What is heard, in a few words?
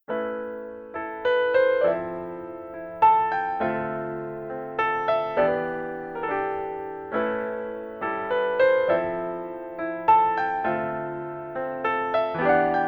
empty version instrumental